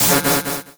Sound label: upgrade explosive headshot; tf2